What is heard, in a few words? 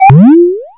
insertion; tap touch run